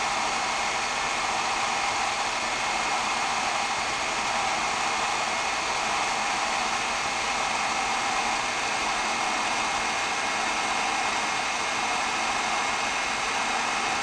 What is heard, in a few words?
machine